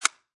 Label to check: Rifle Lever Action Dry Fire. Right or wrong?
Right